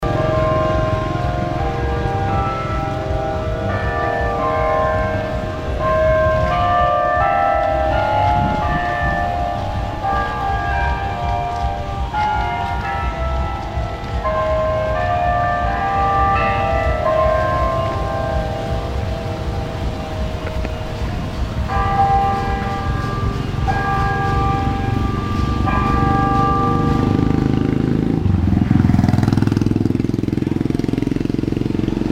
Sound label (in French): cloche de mananjary